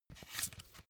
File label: magazine flip back soft